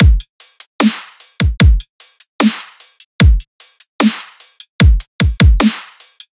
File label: Drum Loop Dubstep 150; Full Drum Loops; Trap; Ghosthack; Free Trap and Dubstep Samples 2018